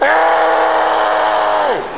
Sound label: AHHH; colours